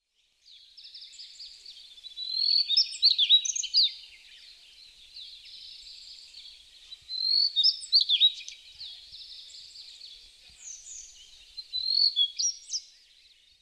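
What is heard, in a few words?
jobitaki, Daurian Redstart; hyogo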